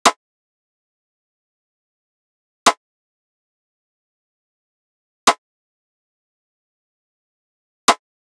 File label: Drum Loop 92 BPM Rim; Loop Stems; Livid Drum Loop; Cymatics; Vibes II Drum Loop Collection